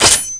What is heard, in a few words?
ankle snare; hunter